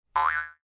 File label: new spawn; petparty